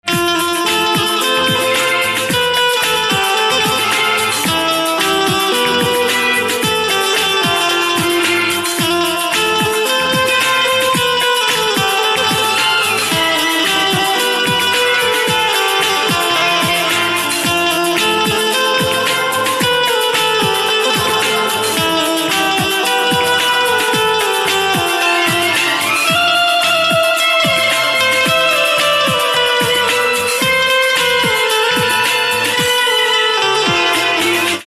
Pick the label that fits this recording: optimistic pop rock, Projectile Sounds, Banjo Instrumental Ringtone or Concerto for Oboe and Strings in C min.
Banjo Instrumental Ringtone